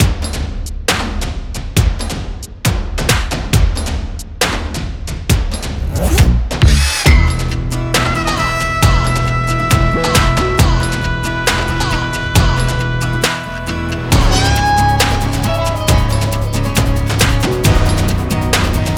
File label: Template 136bpm; Wuerth